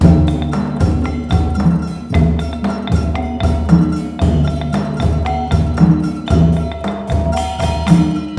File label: MALAY L, Heart of Asia